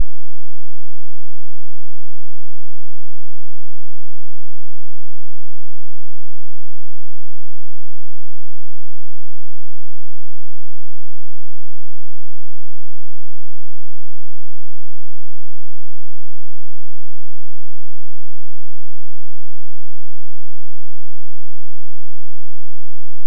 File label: .5 Hz Square